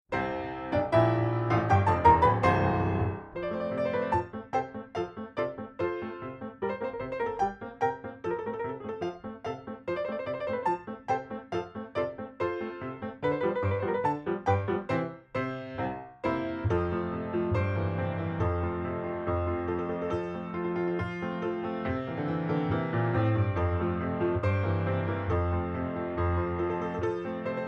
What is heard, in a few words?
Piano